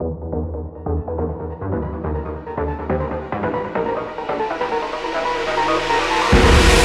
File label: Boss Battle First Run Intro; Glitch's Trip